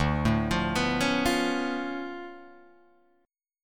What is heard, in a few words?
D Minor Major 13th, down, Guitar, DADGAD